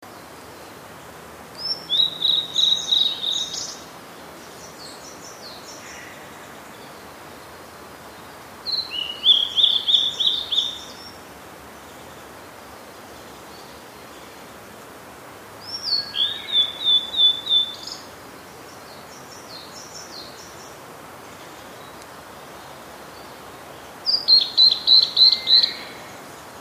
oruri

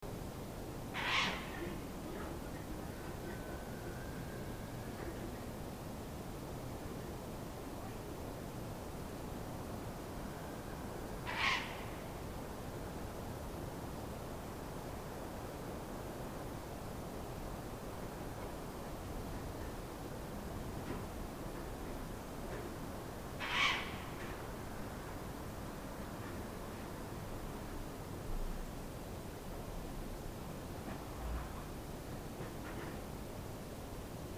fukuro

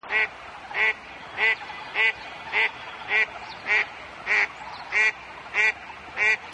karugam

